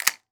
Weapon Gun Shotgun 12 Gauge Benelli Automatic Ammo Load; AdobeCC